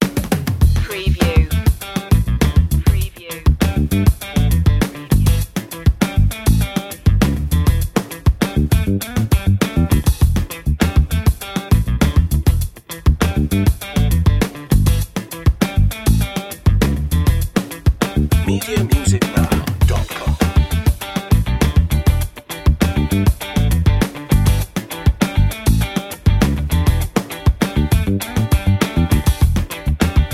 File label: funkmaster no horns